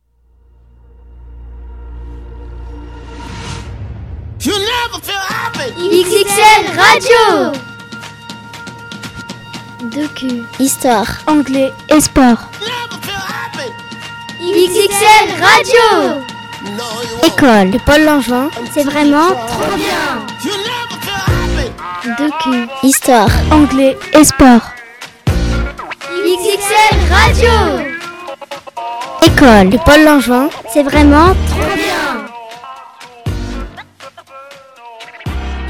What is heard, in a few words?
Jingle